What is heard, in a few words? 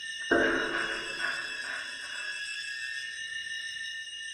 Spike Roof Trap Creak; Lethal Company